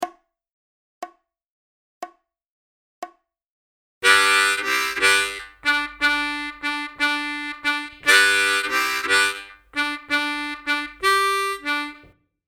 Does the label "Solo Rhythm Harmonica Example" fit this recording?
Yes